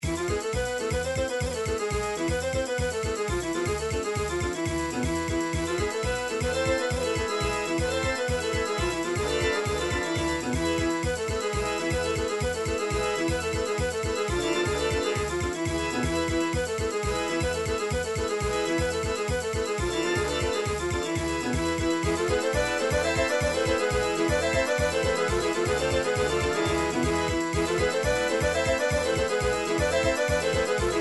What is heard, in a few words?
folk bulgaria horo gankino, midi